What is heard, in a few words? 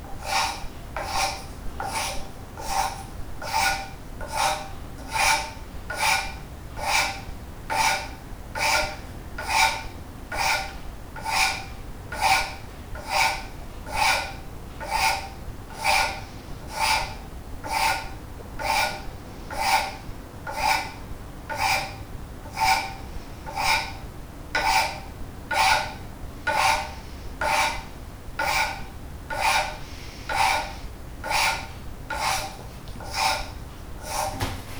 rub tone on 198hz wood tone base; Rub Tone technique in Violin wood selection